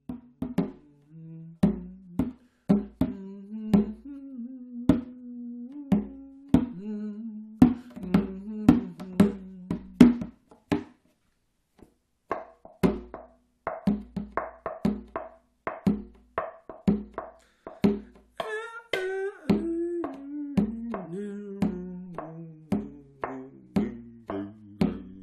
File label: music improvisation excerpt; 1m23s; MUSIC IMPROVISATION EXCERPT FOUR